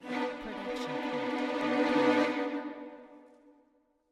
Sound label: dramatic tremolo; SoundsCrate